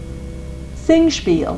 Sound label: sing